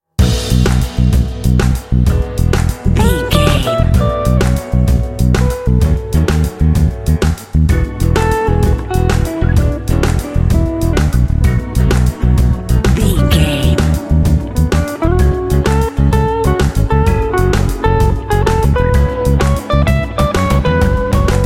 juicy blues jazz

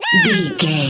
voice fun ant creature

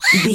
voice fun creature small mutant voice emotes

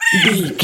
goblin fairy hurt pain choke

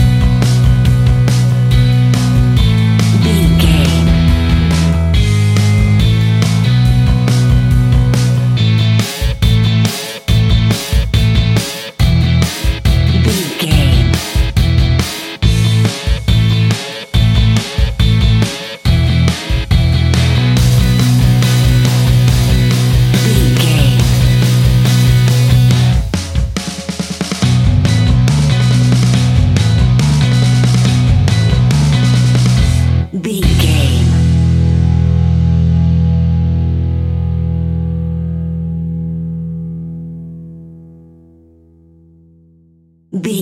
pop rock; Surfing The Pop Rock Skies 30 Sec Mix; Catch 22 Music Network

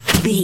bullet impact snow

